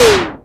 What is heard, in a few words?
Ensoniq ESQ-1 Hi Synth Tom